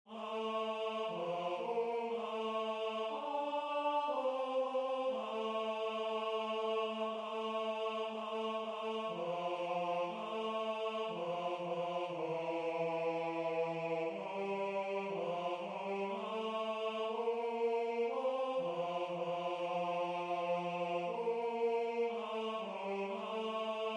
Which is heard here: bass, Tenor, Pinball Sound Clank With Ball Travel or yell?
Tenor